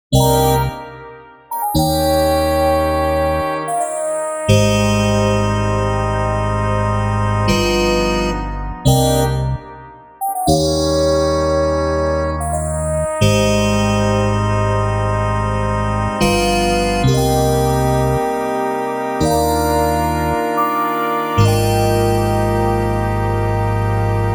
electric piano; fire by music sound software

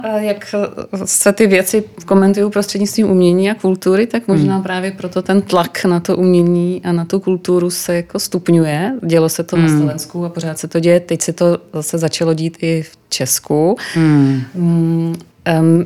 after antibleed; antibleed by clarum audio

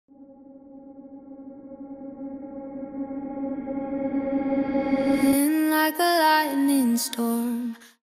reverse reverb swell; bixlab

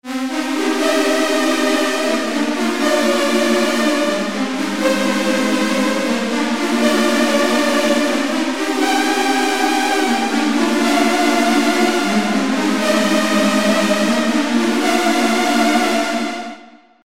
cirrus brushed steel

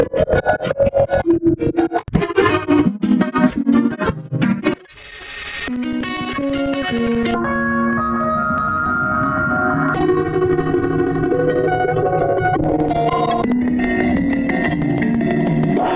electric

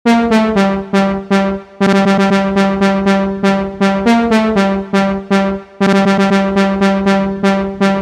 serum preset brass hexagon; unique brass expansion for serum; monosounds studio

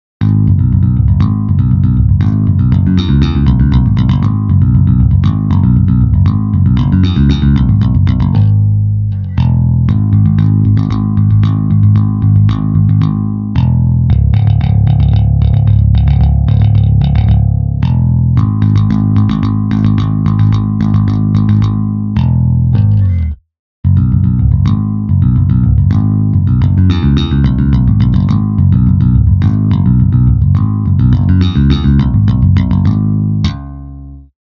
pick bridge neck, virtual EII BTL MIDI rock bass; pfundstein audio plugins

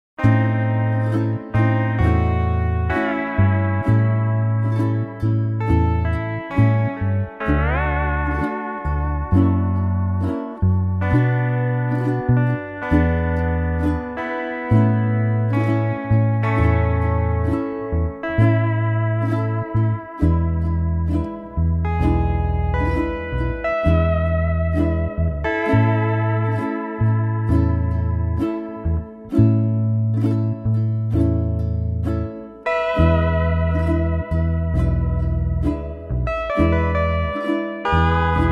lap steel; lap steel guitar; tiki instruments; chiqui audio

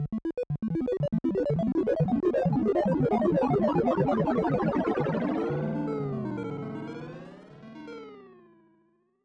arp mod; modular effect board; sonic instruments

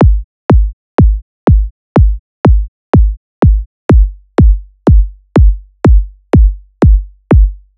Punch Tremor

